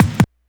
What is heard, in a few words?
kick, Hardcore Breakbeat; Big Fish Audio - Hardcore Breakbeat Action; Disconnected